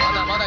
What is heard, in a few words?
ping; japan